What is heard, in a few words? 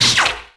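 laser impact ricochet; weapons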